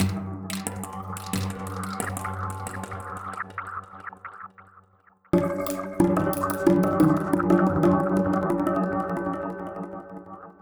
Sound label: Perc